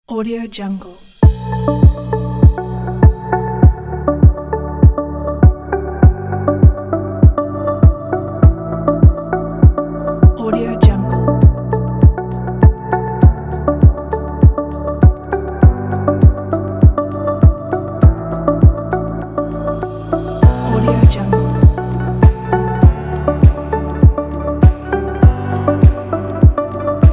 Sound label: corporate ambience